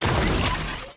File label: rocket turret activate; Factorio